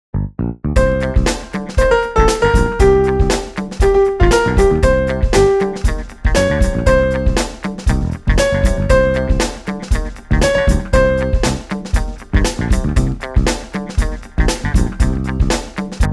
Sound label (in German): Playback + Melodie